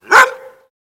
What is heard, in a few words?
Dog Bark CU High Pitch